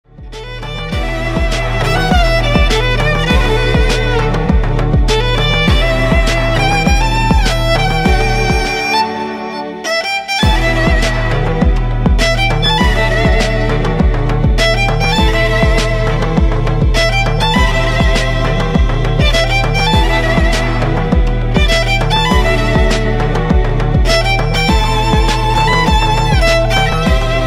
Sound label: violin